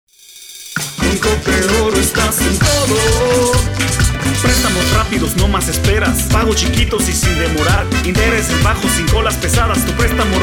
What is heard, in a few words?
SPOT JINGLE; COPEORO